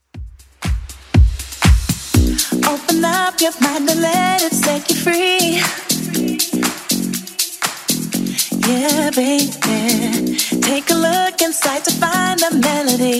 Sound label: 80s Club Edit 120bpm